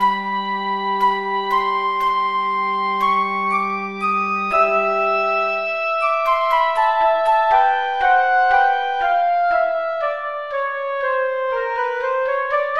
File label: Orchestral Woodwinds; DAL Flute; Syntheway